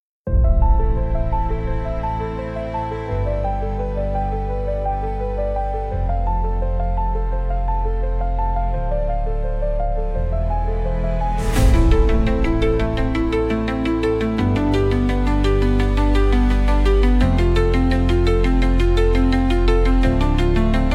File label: intense emotional motivational music